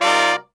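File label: Section Chord m9, Roland BK-5; Loops & Phrases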